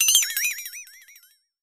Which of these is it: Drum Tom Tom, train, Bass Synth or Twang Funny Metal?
Twang Funny Metal